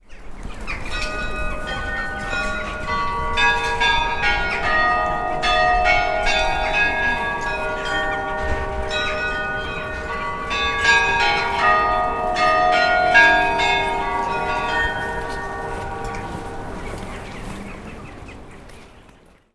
chimes; sergiyevposad

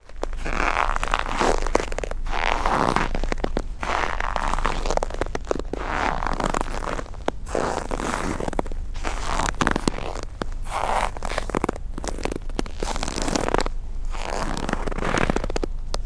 snow, sergiyev posad

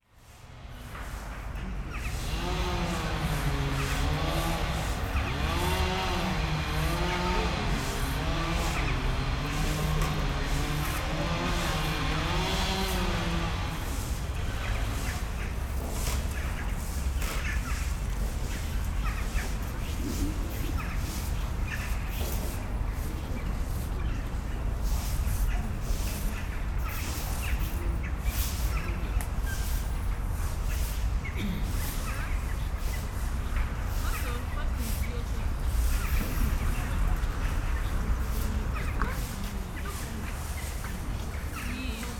monastery; sergiyevposad

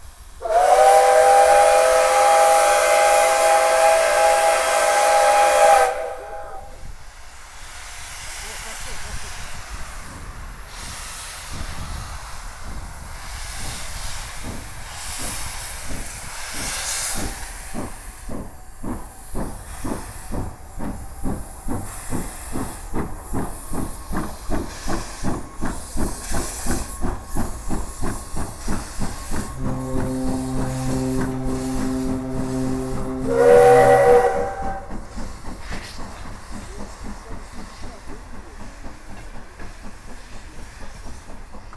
steam train leaving; sergiuev posad

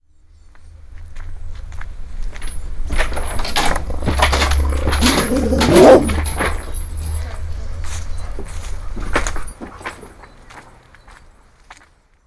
binaural dog, sergiyev posad

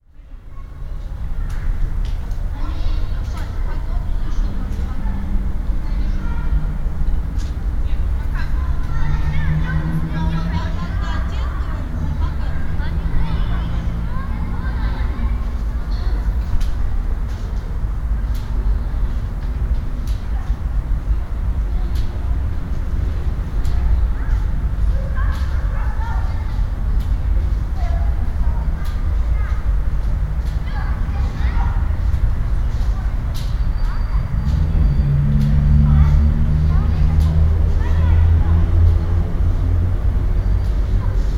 walking stick, sergiyev posad

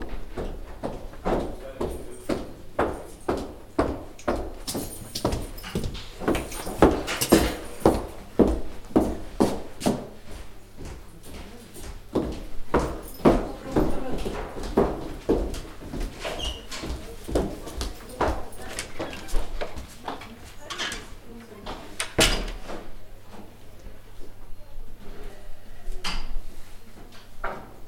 court; sergiyev posad; astenin

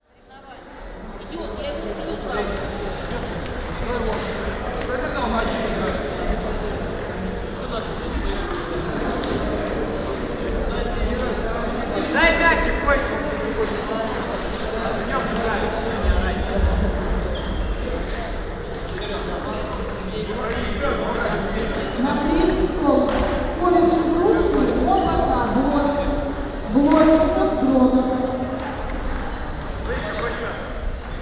ping pong, sergiyev posad

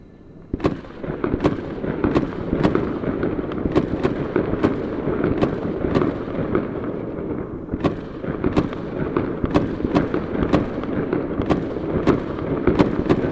fireworks, sergiyevposad

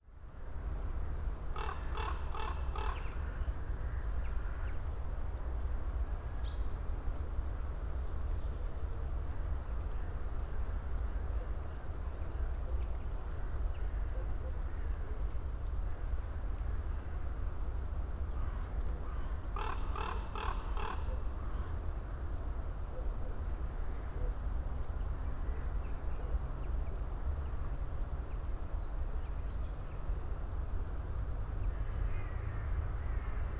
raven, Grazhdanka Sergiyev Posad

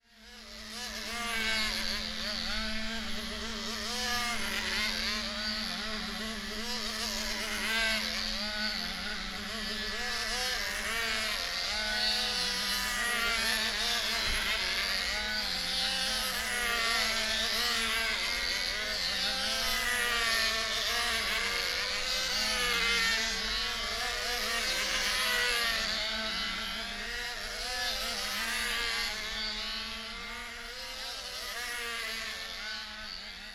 control line aircraft, sergiyev posad

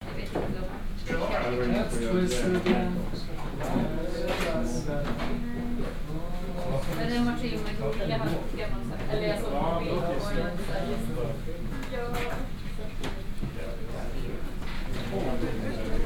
music shop, stockholm

